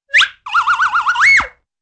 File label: zip in and whirly whistle